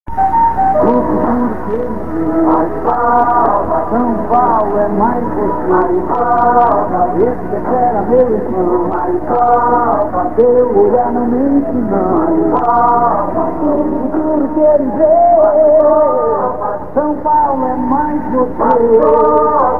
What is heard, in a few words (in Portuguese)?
Jingle; 1990; Mario Covas; Governador SP; jingles politicos